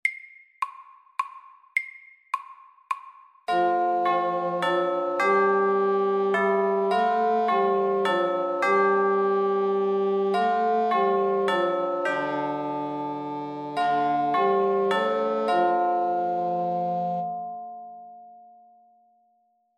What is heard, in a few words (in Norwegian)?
Klokkekling